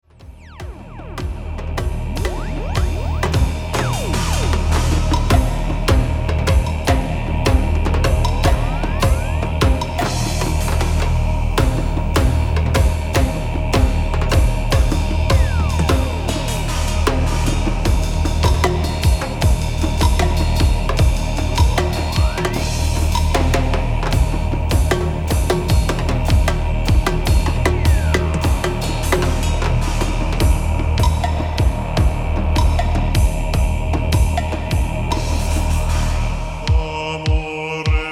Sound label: Drums